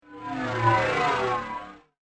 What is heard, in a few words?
Sky Police Robotic Patrol; science fiction sound effect; Robot Sound Effects